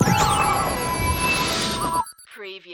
Hit Digital

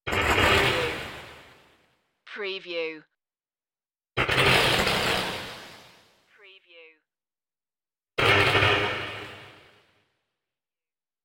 transform disappearance beams

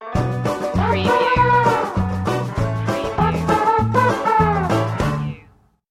cleos jig stinger

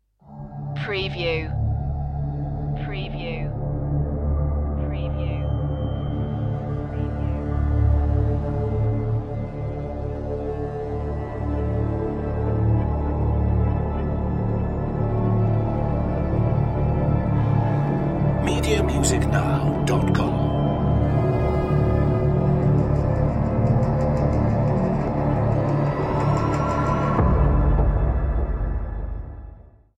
creepy hollow

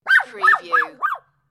Little Dog Barking

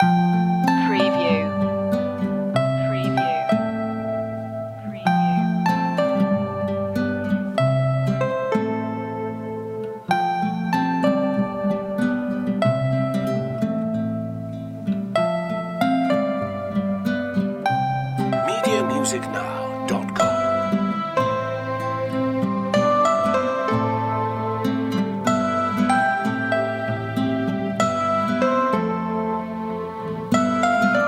dreamy bells loop

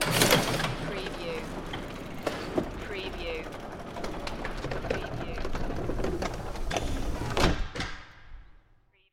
Heavy Electric Motorized Door Close

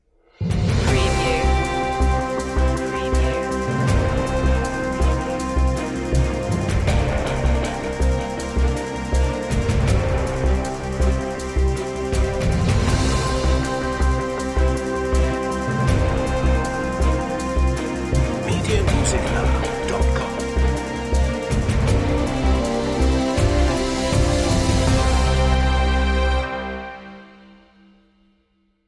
informative news

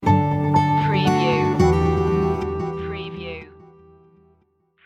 summer morning light stinger

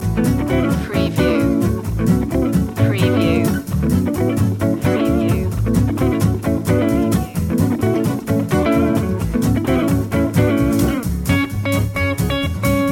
hop loop

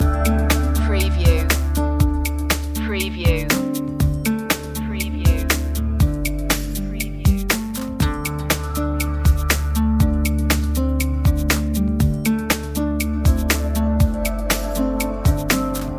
floating guitars loop, chill

